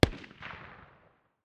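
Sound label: light machine gun far distance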